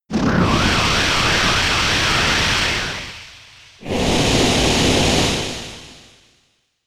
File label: Sandstorm; Pokemon; gen 7; SUMO; USUM